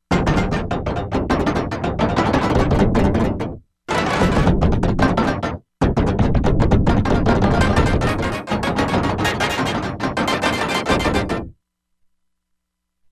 Caution, Korg MSC-16S; Environment

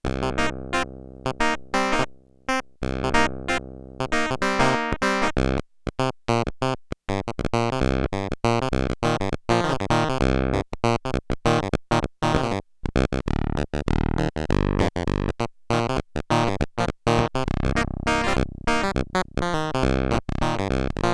Clav, Roland JX-8P

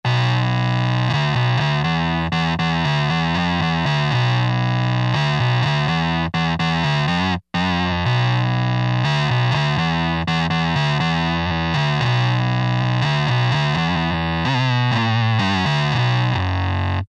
Juno Dist Bass; Roland SRX-05 Supreme Dance

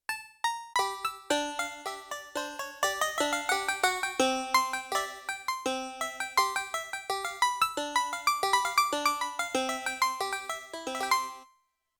Banjo Piano; Yamaha TX16W; Jazz Performance; Jazz Performance 2

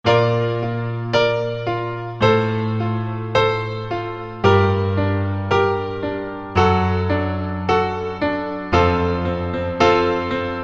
Piano Trail, Alesis ZA Stereo Jazz Piano

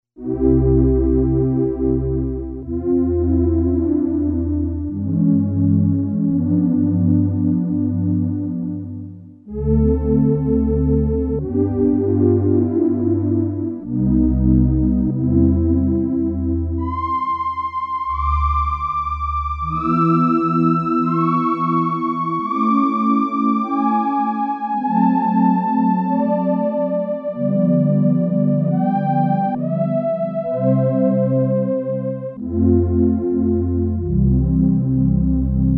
Chorus, Casio CSM-1; Tones

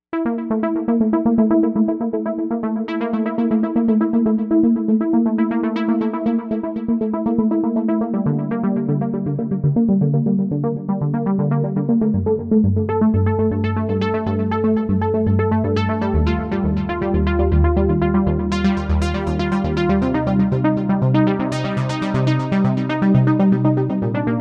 SoftNag, Novation UltraNova; Factory Patches

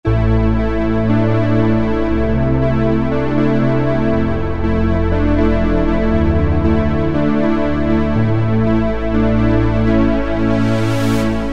Strings; Cheetah MS6

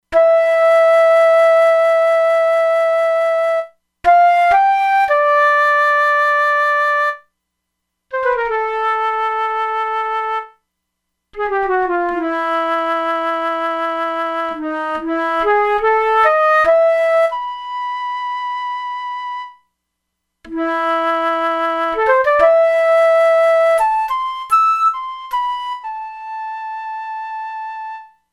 Flute, E-mu Proteus-2; Factory Presets

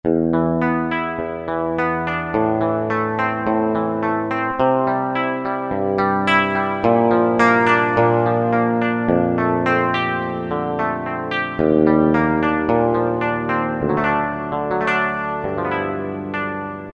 E. Guitar 2, Korg T3

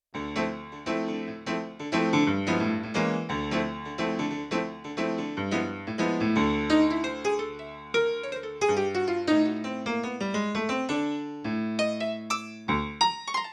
Dynamic Grand Piano; Yamaha TX16W; Yamaha CFIII Grand Piano

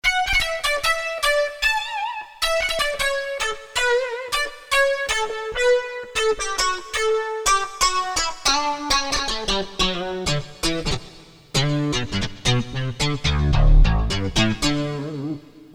Digi Lead, MIDI Combos, Korg DSS-1

